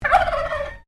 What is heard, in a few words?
Bird Turkey Unknown Gobble, Australian birds